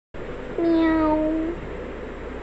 meow

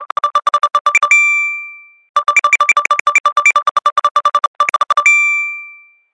apple pay ping

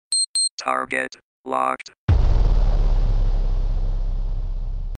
target locked

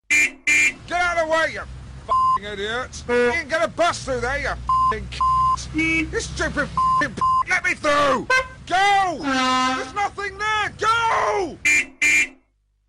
traffic rage

